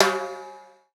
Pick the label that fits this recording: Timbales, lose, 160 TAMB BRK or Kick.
Timbales